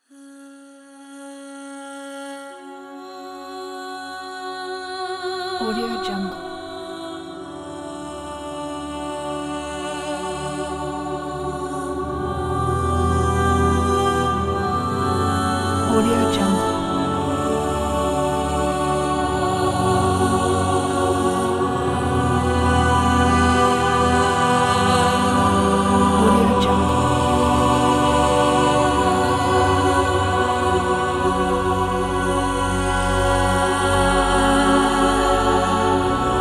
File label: ethereal voices